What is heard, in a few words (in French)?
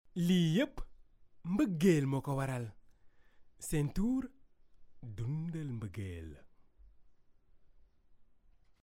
tonalite